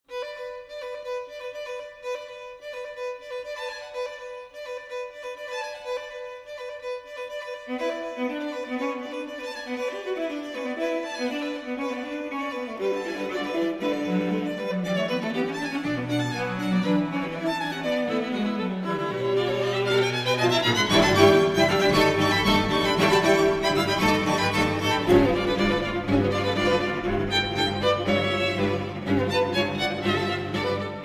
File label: string quartet; rathaus; no. 4 mvt. iii